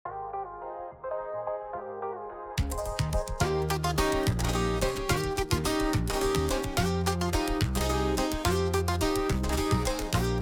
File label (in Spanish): corrido